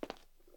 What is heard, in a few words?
footstep; gmtk24